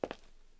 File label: footstep; gmtk24